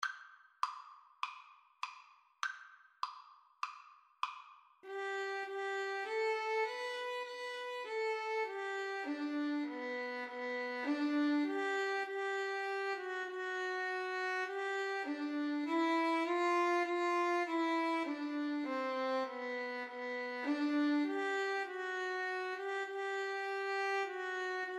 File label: joyful joyful, Violin Flute Duet